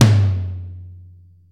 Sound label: ambient tom; Greytsounds VOL-1; PROCESSDRUMS